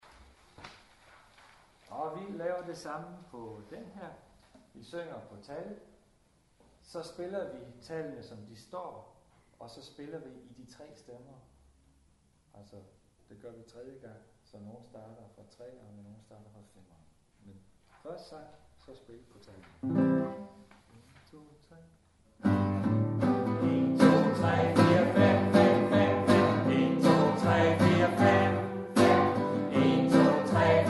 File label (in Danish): Sang